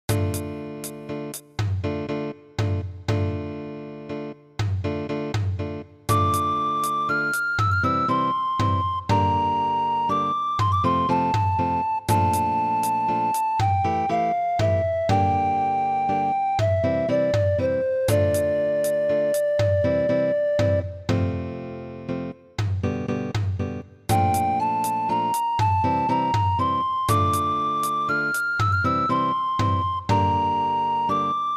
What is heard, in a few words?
lullaby